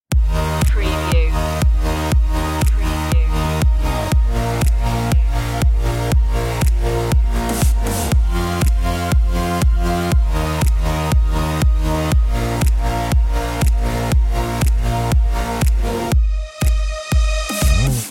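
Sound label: Electronica Electropop underscore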